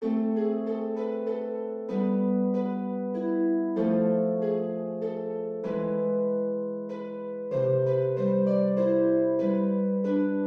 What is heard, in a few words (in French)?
menuet mineur; purcell henry